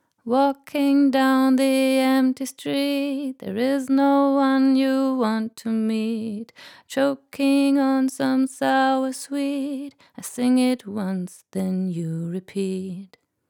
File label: Gyraf Audio G14 S Vocal EQ Tube 3 dB Gain